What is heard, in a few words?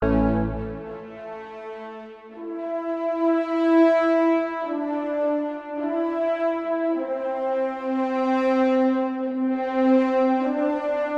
Global Conversation Happy Triumphant